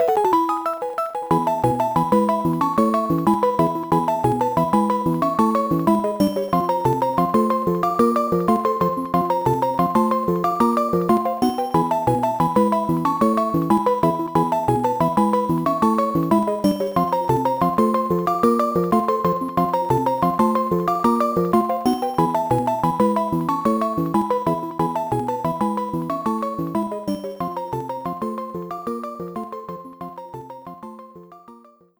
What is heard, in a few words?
Victory! (Old Style)